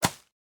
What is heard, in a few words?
hit